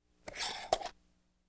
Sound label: Household Phones